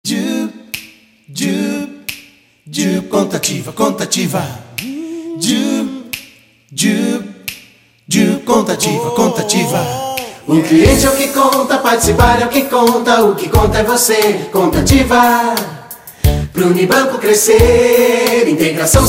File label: JINGLE; UNIBANCO